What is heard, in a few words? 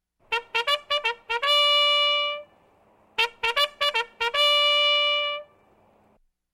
Bugle Call Outdoors - Fanfare